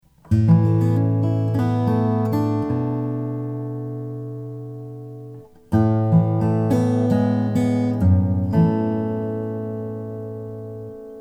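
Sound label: kevin ryan cathedral grand fingerstyle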